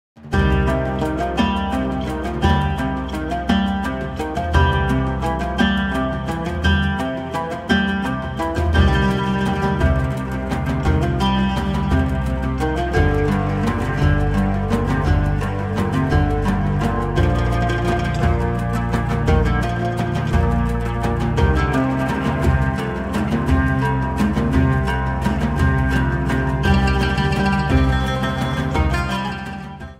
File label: oud ringtone; android; game of thrones